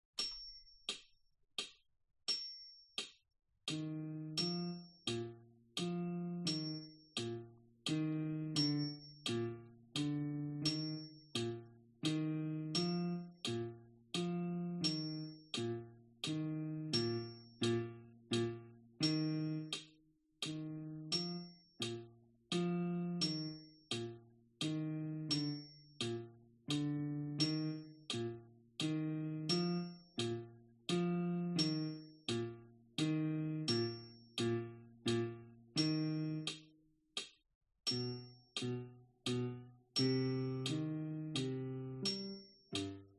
minuet cello; Beethoven

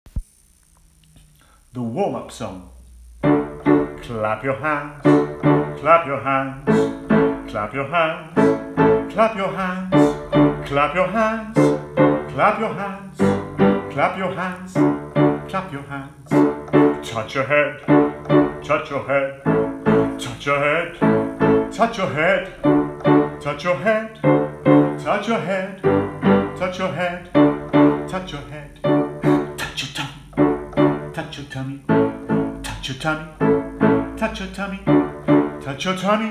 Warm Up Song; MT Saplings

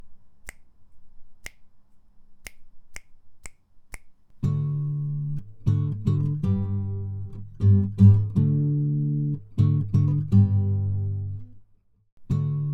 chords; Ode To Joy

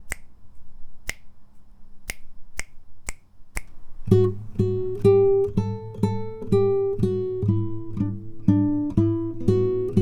Ode To Joy melody

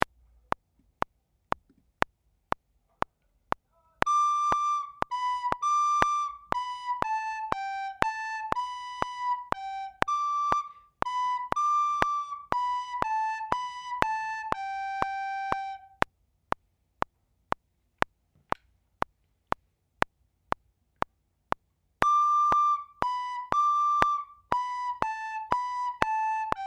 Half Minute Waltz 1st Recorder

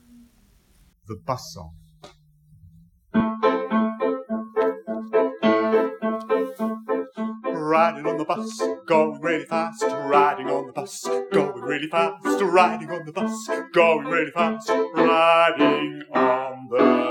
Bus Song; mt-saplings

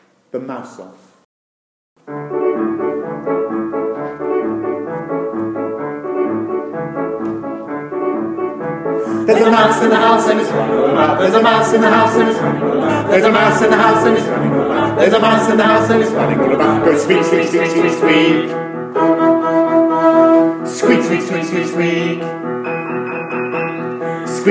Mouse Song; mt-saplings

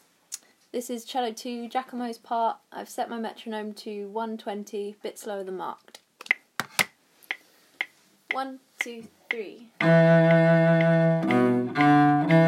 Cello 2 part

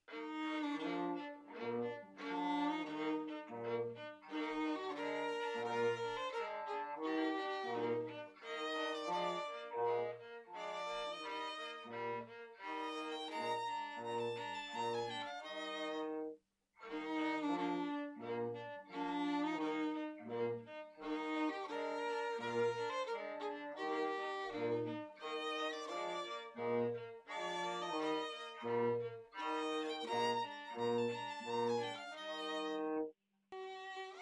minuet mix; Beethoven

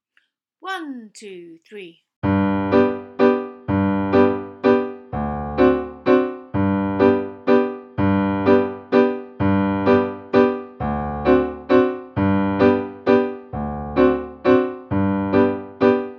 16th waltz piano accomp one bar count in

